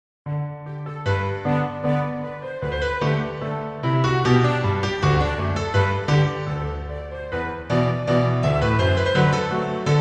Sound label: Theme Orchestral Arrangement